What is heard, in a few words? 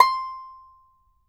banjo C6, banjo notes; Sampleheads - Whole Lotta Country 1 & 2